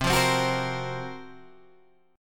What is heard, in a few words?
Gb Major 7th Flat 5th